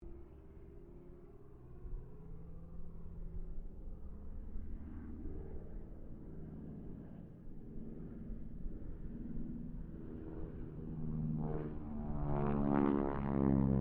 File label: AIRCRAFT AT-6D HARVARD MK III PASS DISTANT; Temora Harvard MK III; Airplanes; Vehicles